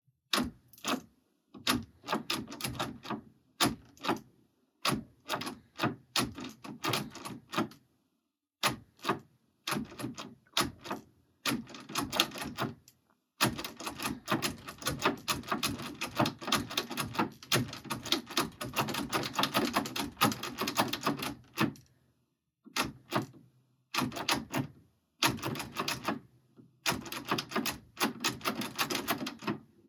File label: Doorknob Rattle